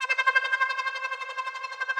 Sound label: Flitting Around Pad, Ethereal Pads; Instruments; Ableton; Mood Reel